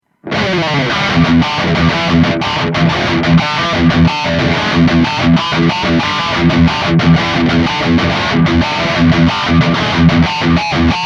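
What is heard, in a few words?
High Gain, guitar; GTRS P800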